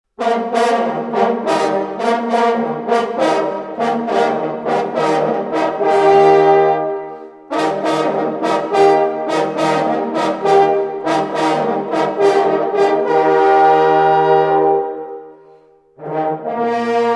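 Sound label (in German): FLUGWILD TOT Jagdhornbläser; Schlanders; St. Hubertus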